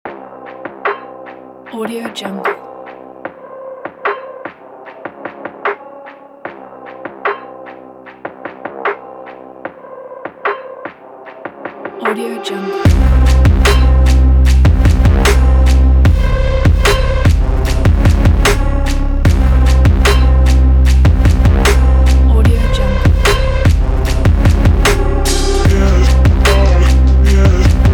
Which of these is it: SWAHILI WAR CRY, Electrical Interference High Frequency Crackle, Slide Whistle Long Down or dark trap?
dark trap